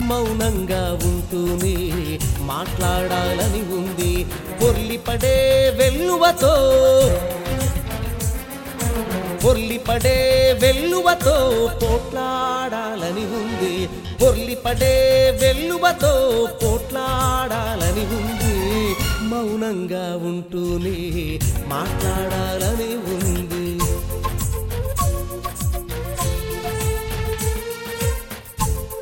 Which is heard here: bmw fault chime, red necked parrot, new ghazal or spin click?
new ghazal